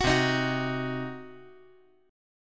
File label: C Diminished C, 5G,4C,5E,5A